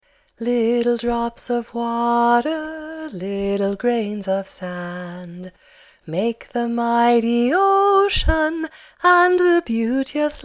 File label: Little Drops Water